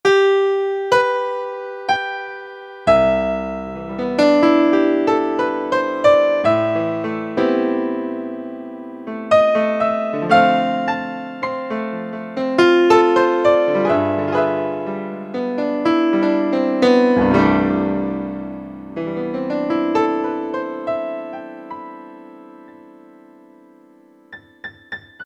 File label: Grand Piano